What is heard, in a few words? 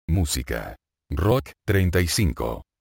rock